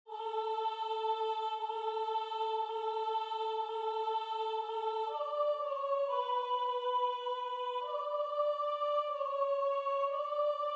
OBOE

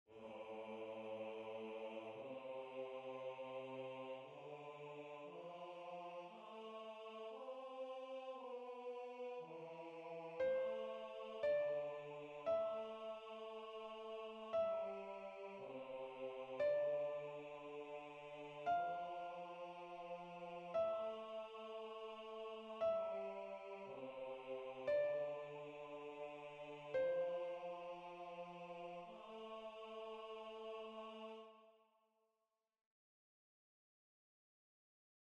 piano

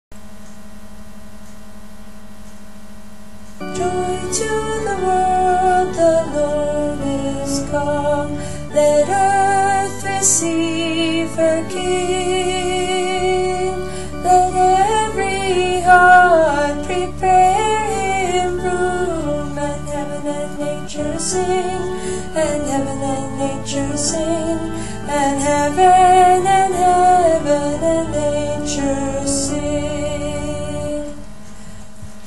Joy to the World alto part SATB

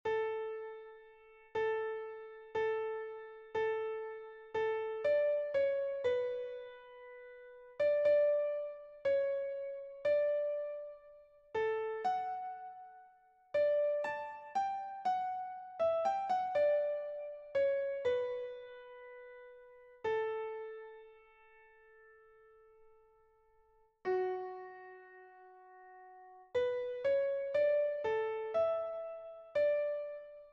OBOE

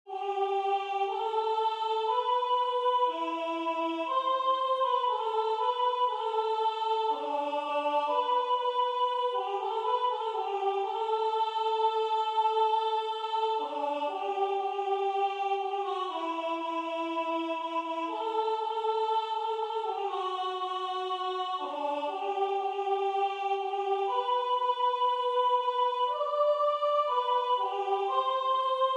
Santo breve tutti; Bonfitto

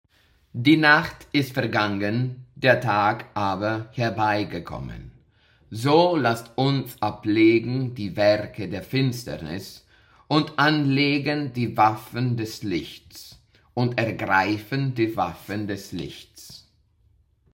Chor

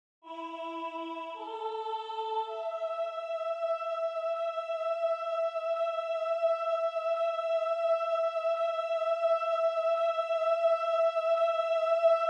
soprano

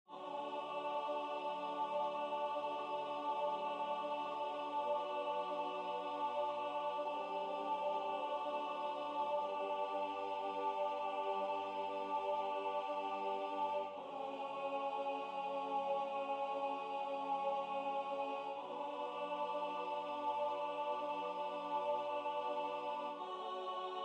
contralto voce su voce

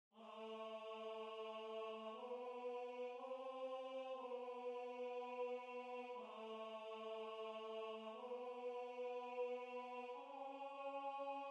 tenore

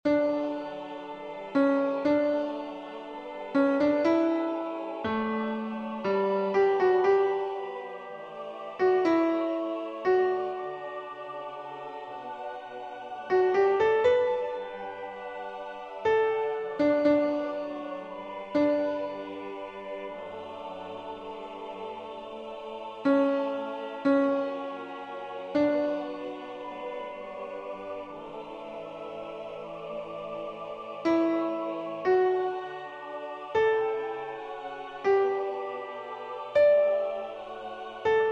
OBOE piano

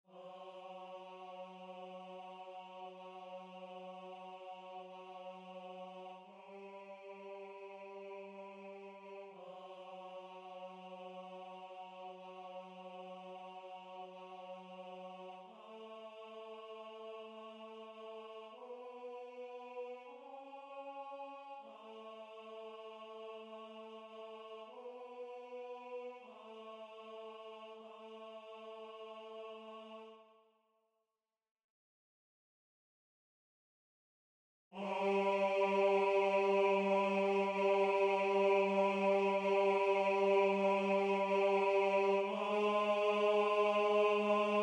tenore

